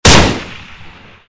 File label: g3 single